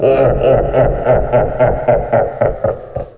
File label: fiend